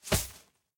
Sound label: money Bag Land; robin hood